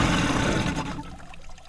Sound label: Boat Idle Stop; Vehicles